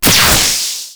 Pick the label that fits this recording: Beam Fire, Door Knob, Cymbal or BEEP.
Beam Fire